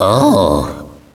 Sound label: ohhh, Echo inside caves, underground; DS; ML BOWSERS INSIDE; Luigi